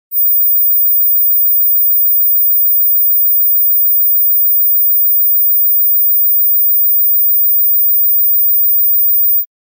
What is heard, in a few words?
High Pitch Ringtone